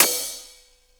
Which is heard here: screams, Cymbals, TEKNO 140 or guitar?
Cymbals